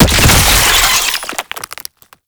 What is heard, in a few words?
Oraxia Brood Spider Explosion; Warframe